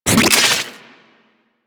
Energy Pickup; UI; Pickups; Warframe

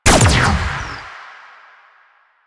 Fire Shot, Weapons; Duviri; Incarnon Adapters; Incarnon Cestra

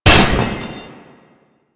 Umbrella Stand Destroy; Warframe; Nineteen Ninety Nine

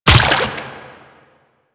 Step Water, Footsteps; Warframe; Quests; The New War; Kahl

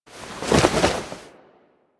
Head Shake Foley; Cinematics; Shrine Intro; Warframe; Sacrifice; Umbra

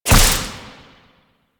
Crack Impact; Warframe; Warframes; Khora

